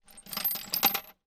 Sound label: Metal Bolts; 99Sounds; The Warehouse